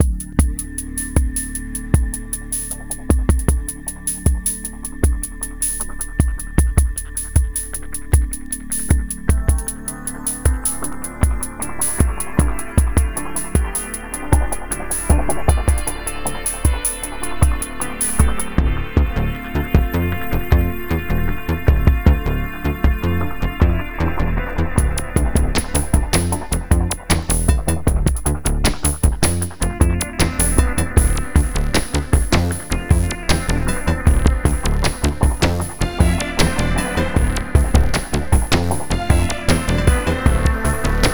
music

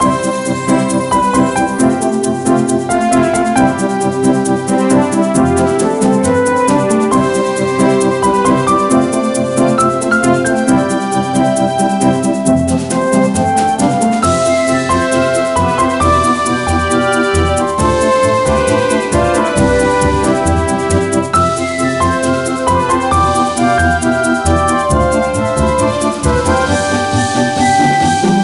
BGM Sail; aranuri; nds

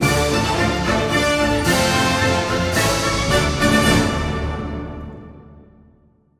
Battle Cleared Spooky Skirmish; mario rabbids kingdom battle complete video game soundtrack

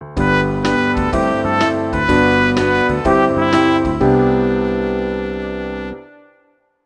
Bari Sax

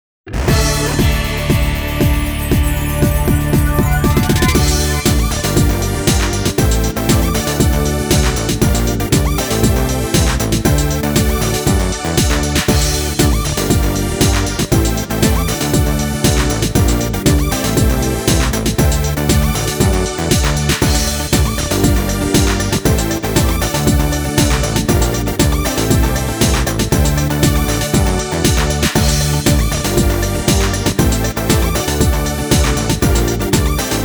Level Up; Digital Devil Saga Avatar Tuner 1 & 2 Original Sound Track Integral

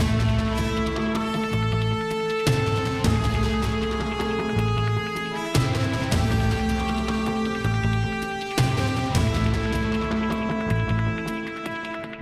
Tornado Platforming MIXED; ben 10

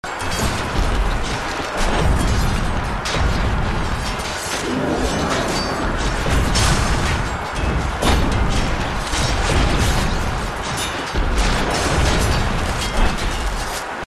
ambient battle field

